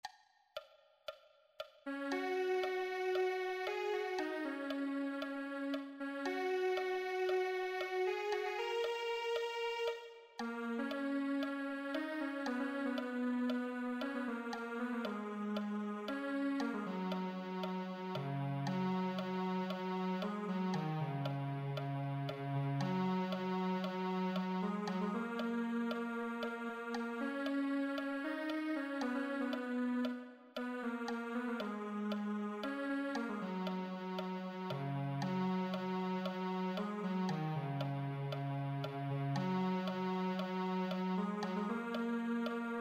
cello

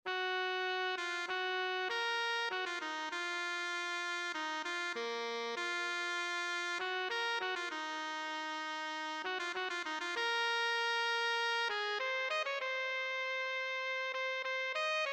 tenor saxophone

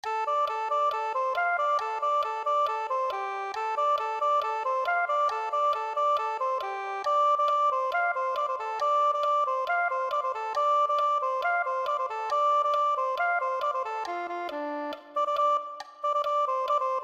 soprano saxophone

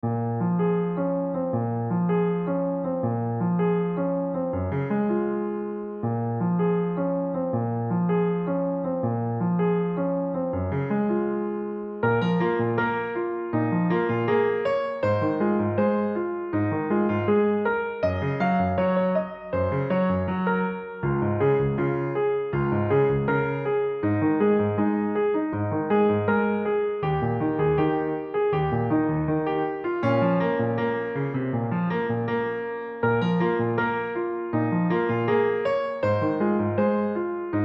solo, piano; Bachna Ae Haseeno

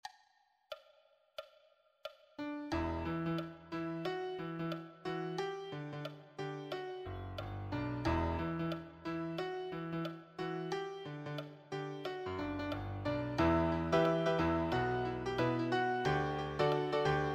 accompaniment

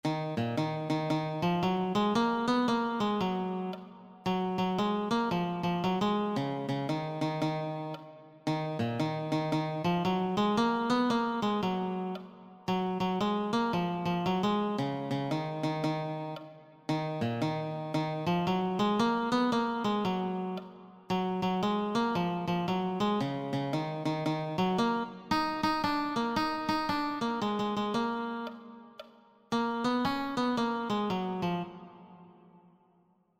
guitar

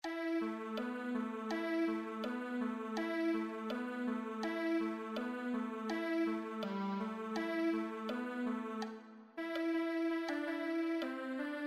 viola

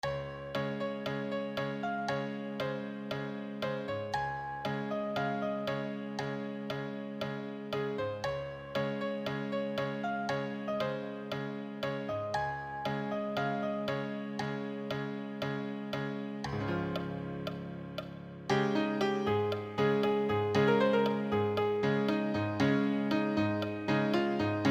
advanced solo, piano

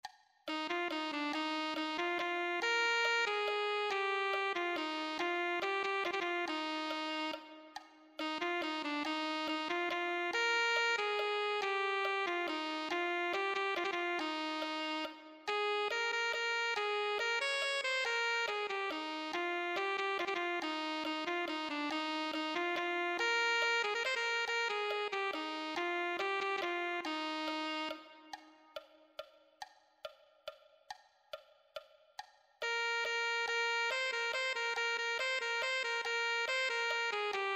alto saxophone

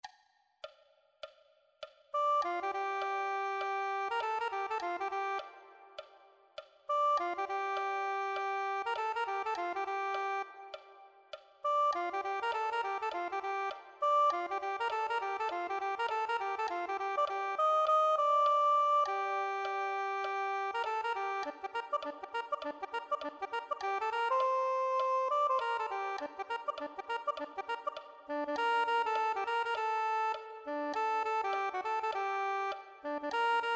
soprano saxophone

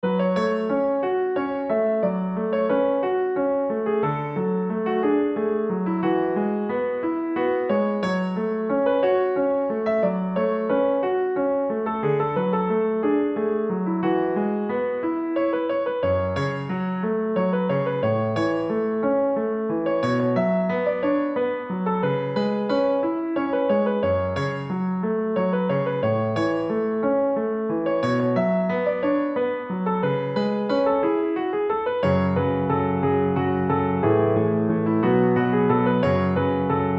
solo, piano

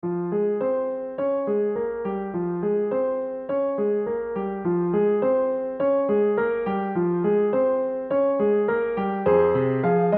Advanced Piano Solo; Aashiqui 2

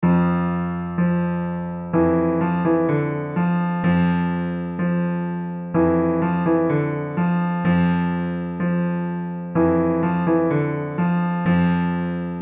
advanced solo, piano

